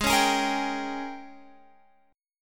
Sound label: G sharp Major 7th Suspended 2nd Suspended 4th, Guitar, Open F; strummed; G#M7sus2sus4